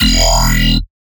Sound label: Dirty Bass E; One-Shots; Bass Hits (Dirty); Ghosthack - Color Bass Essentials